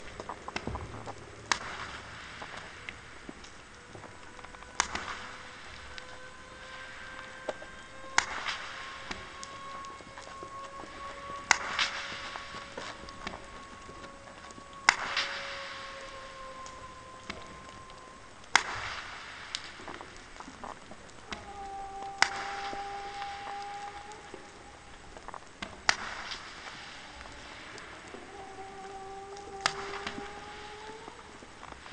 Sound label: click train 10x slower, SRKW; clicks; SMRU; Reson